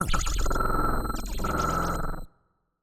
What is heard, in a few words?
Alien Snore